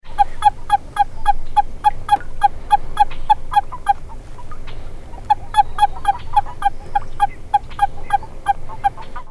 Anaxyrus boreas boreas

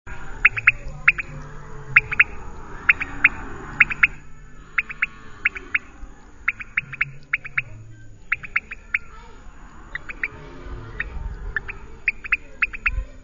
Leptodactylus podicipinus

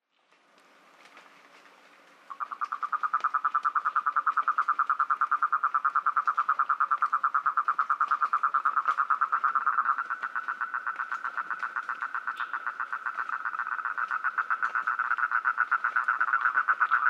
Rhinella ornata

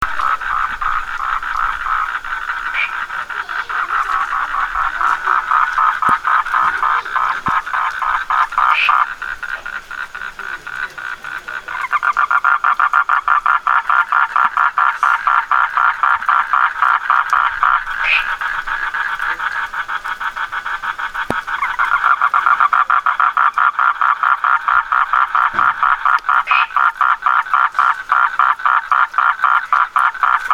Duttaphrynus melanostictus

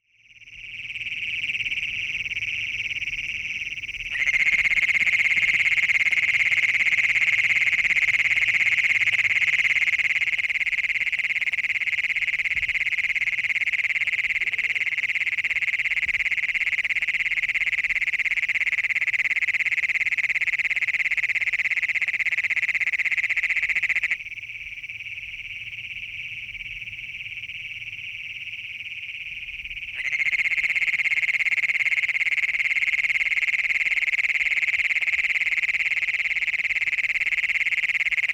Anaxyrus cognatus

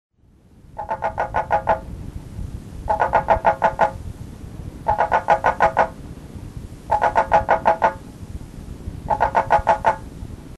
Dyscophus guineti

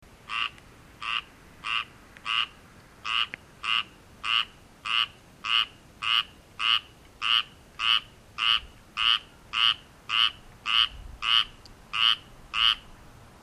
Hyla squirella

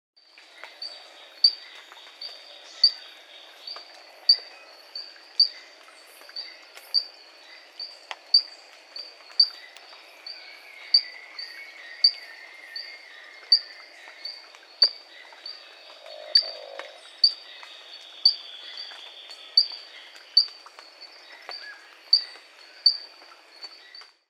Adenomera marmorata

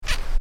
Aneides lugubris

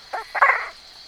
Bokermannohyla napolii

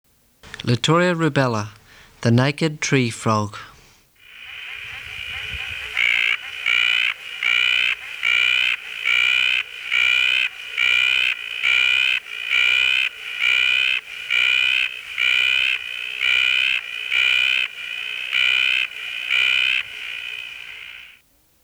Litoria rubella

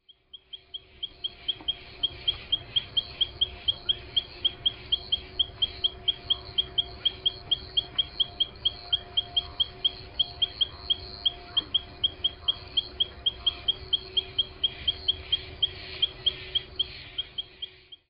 Leptodactylus furnarius

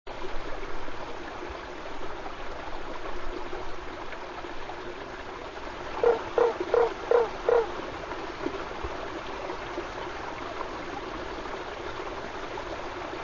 Boana lundii

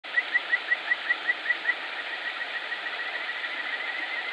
Bufotes luristanicus

